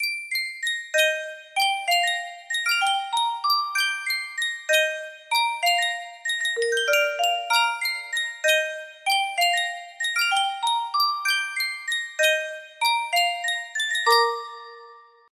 Sankyo music box

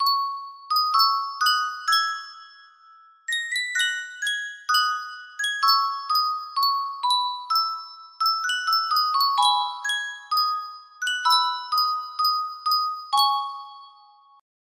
Sankyo custom tune music box

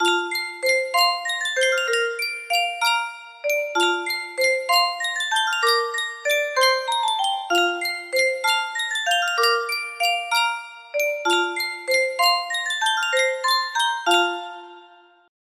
Sankyo Music Box, music box melody

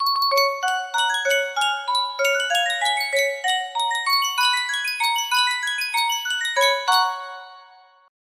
sankyo miniature music box, music box melody; star wars

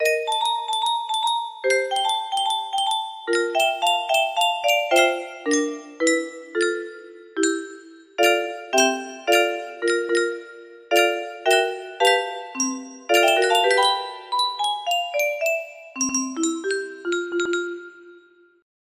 ringtone, music box melody

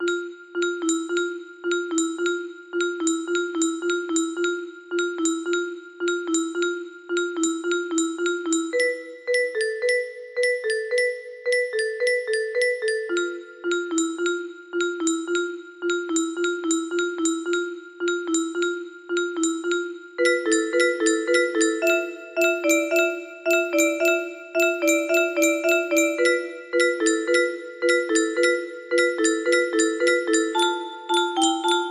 music box melody

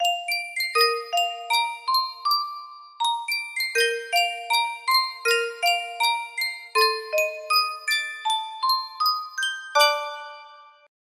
sankyo music box, music box melody

